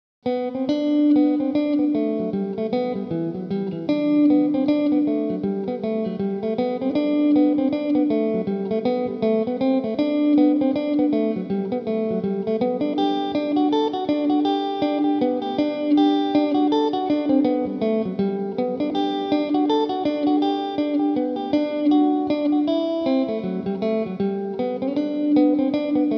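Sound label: reel G major tenorcaster; Eastwood Tenorcaster electric tenor guitar; The Nocturnal Reel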